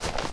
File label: default grass footstep; nalc game